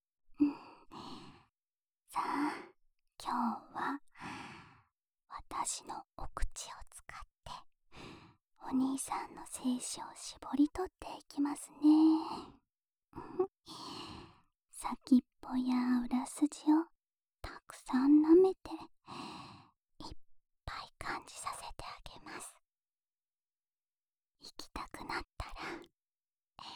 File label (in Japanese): オナサポ用フェラチオループ, ASMR